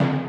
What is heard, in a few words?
timpani